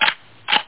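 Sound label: weapon select; Duke Nukem 3D Kill-A-Ton Collection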